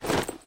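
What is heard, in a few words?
unfolding a camping tent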